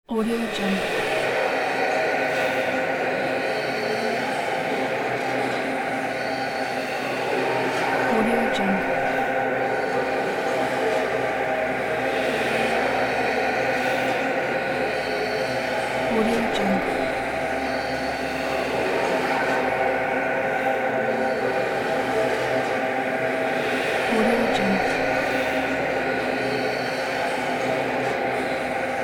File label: spooky breath atmosphere